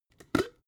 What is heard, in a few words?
jar glass plastic open cap